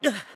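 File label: Hurt, voice; BOTW; Breath of the Wild; Link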